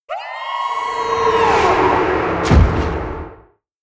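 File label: butterfly death, monster; Senillinea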